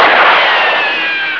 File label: KILLED